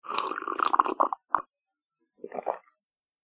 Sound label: Slurping A Cup Of Tea; Slurping Sound Effects; Human Sound Effect